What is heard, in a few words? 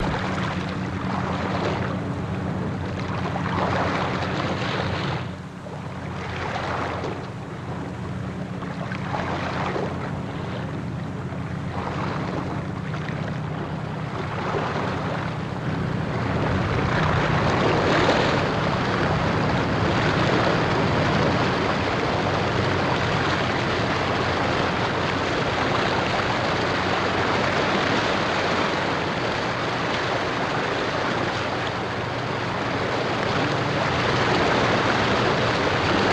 Water Mvmt Gentle Us, bow wash